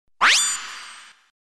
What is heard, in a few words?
Codec